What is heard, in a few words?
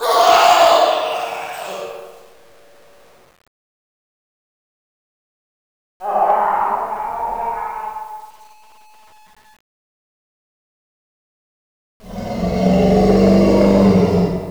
bump in the night